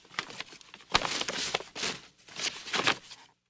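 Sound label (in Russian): шуршание при нахождении нужной бумаги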